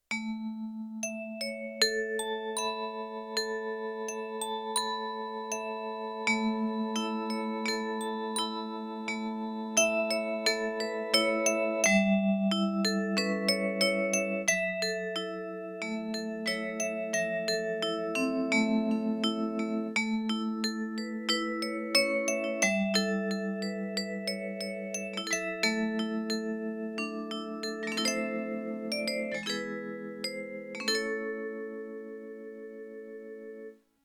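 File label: Glocken-Vibe tremolo; Yamaha TX16W; Vibraphone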